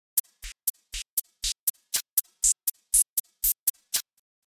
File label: house C1 HH